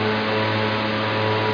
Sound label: spin bar; Hostile